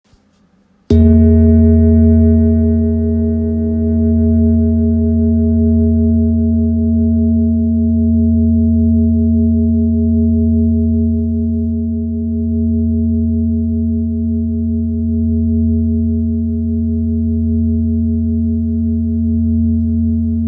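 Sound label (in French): Bol ramgandi mi, bol chantant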